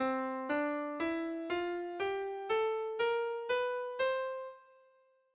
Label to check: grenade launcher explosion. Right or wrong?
Wrong